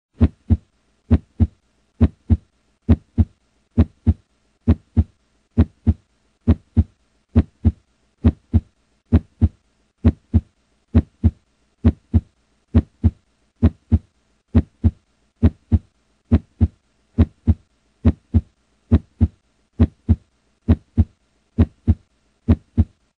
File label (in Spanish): Latido corazon